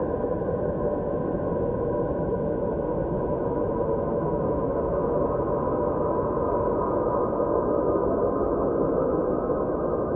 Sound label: ambient battle; World Of Warships